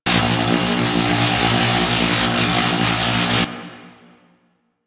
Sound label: Ground Laser Loop; Enemies; Abilities; Warframe; Teralyst; Bigalyst